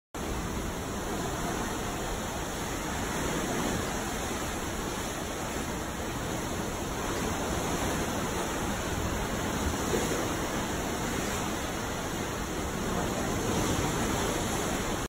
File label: ocean at night